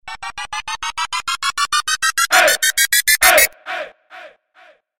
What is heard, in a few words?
rap sms notification ringtone